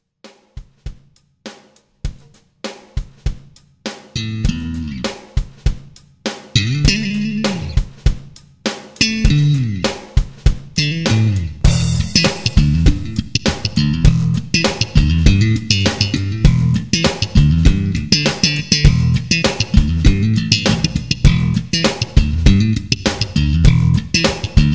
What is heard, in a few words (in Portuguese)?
metal rock